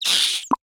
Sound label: diminish